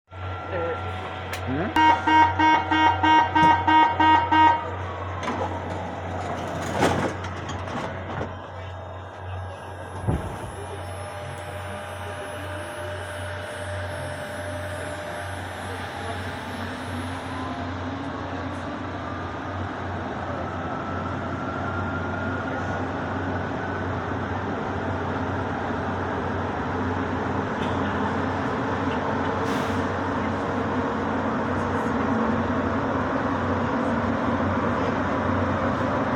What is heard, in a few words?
lyon, bus